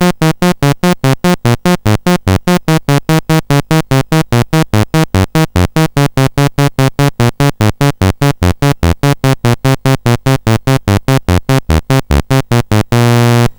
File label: sid CSG8580R5; Lernaia BASIC